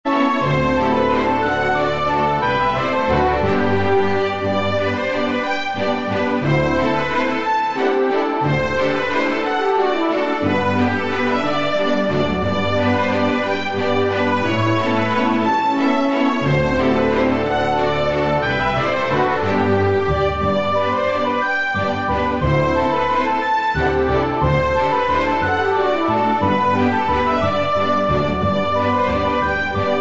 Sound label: Waltz; bg-music